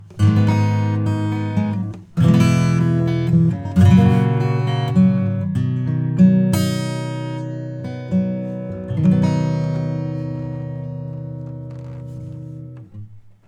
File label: acoustic; MASSIVE